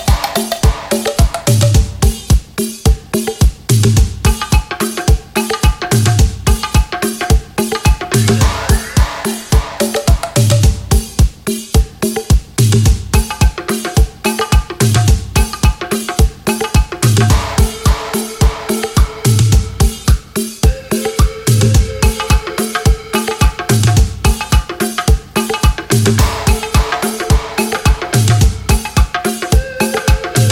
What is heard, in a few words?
Drum Dub